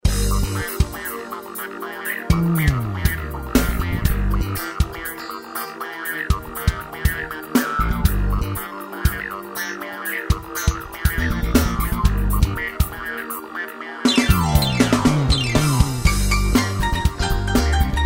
piano

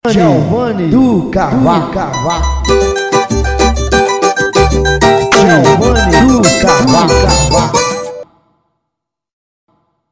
vinheta cavaco

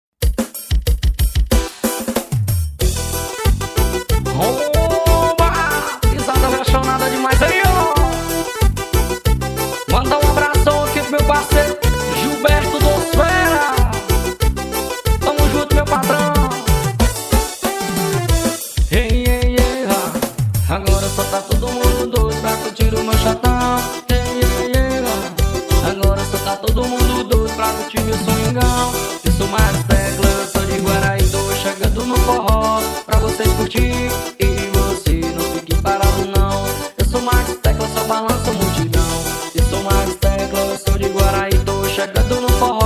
forro

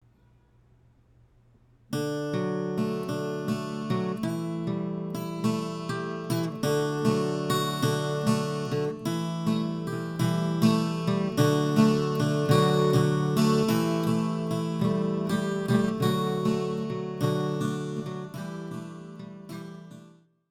trilha sertão; trilhas para filmes

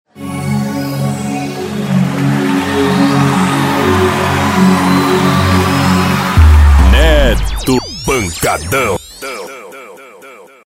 abertura vinheta shows

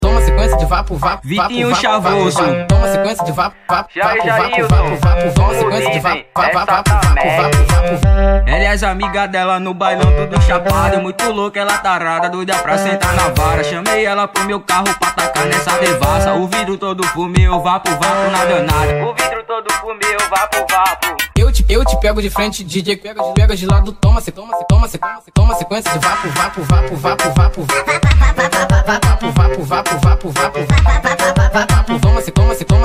brega funk

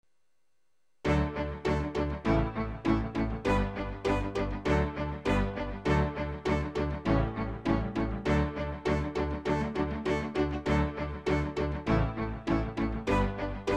tecladista hino nacional de portugal

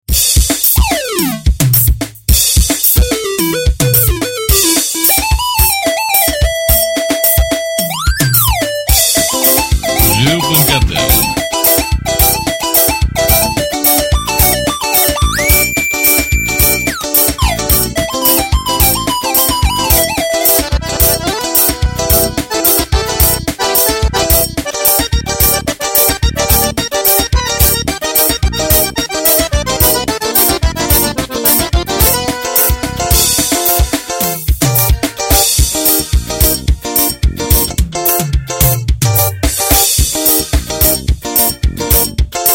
forro

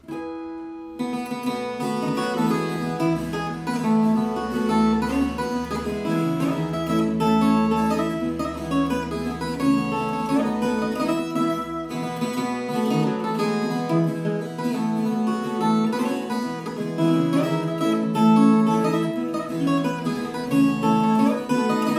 viola

